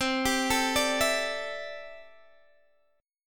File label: C 6th Add 9th, C, down, Guitar, Open G